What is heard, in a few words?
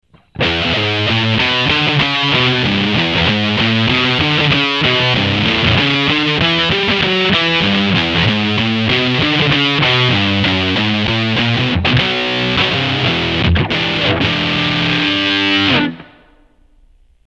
fat drive, fuzz factory, オーバードライブ〜ディストーションっぽい感じのセッティング